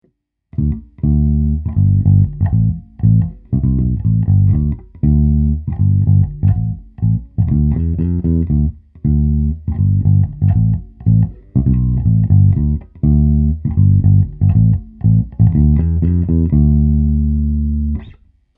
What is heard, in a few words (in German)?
Tec Amp Taste Mid Picked; Tec Amp Sound Test